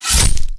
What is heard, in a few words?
SWORD